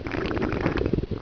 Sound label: alien